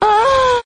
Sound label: female orgasm